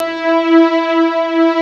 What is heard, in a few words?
BIG PAD E5; East West; Global Sounds; MKS